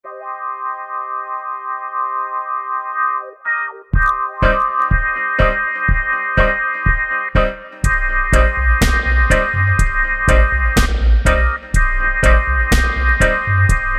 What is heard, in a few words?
dread drumz mix; dread drumz by soundfingers